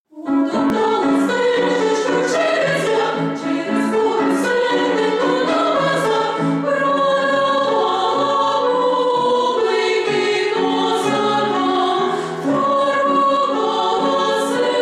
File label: instrumental